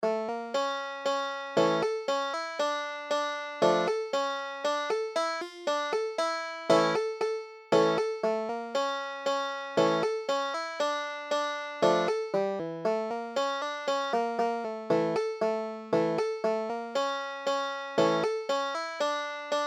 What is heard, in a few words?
chusidl, banjo